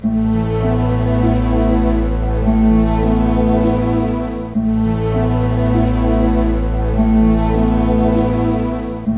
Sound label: CLOCK; East West; Scoring Tools; ORC; Clock Time